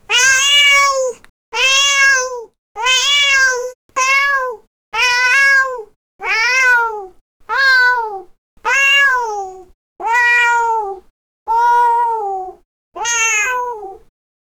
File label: create sound brave cat me, brave